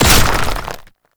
Archgun Charge Full; Warframe; Weapons; Archwing; Tn Concrete Archgun

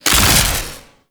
Harvester Disassembler Shield; Warframe; Cinematics

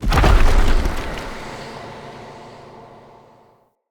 Warrior Statue Bust Pedestal Complete

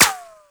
Bullet Impact Metal; Warframe; Bullet Impacts

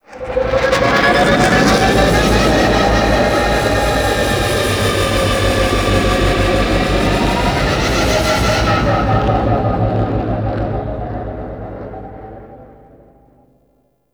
level intro docking, vehicles; Warframe; Liset; Nora Liset

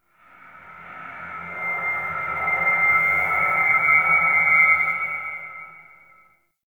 Interior Stadium Feedback; Warframe; Ambience; Nineteen Ninety Nine

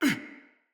Pain, Battle Barks; Envoy

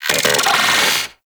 Arachnoid Wraith Vocal Pain; Warframe; Corpus

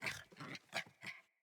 Kubrow Puppy Idle Growl Playful; Vocals

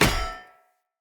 Sphere Polearm Medium Impact Default; Player; Environment

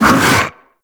Barrier Cast; Warframe; Brawler

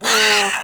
Kavat Vocal Pain; Warframe; Khora

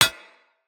Bullet Impact Armour; Weapons

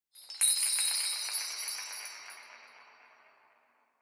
Clan Dojo Small Bell Jingle; Warframe; Dojo Randoms; Ambience

